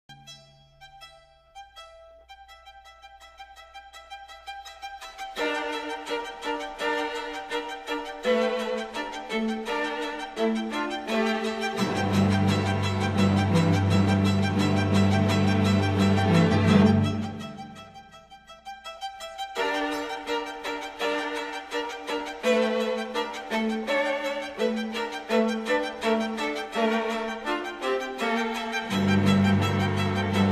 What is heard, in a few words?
String Quartet No 11, in F Minor, Op. 122; Dmitri Shostakovich; Complete String Quartets